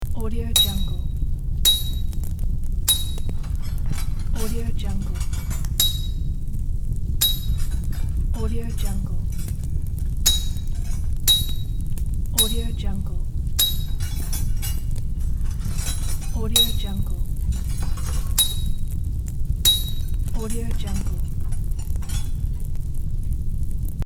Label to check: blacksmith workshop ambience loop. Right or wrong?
Right